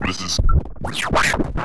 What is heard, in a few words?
Processed Vocal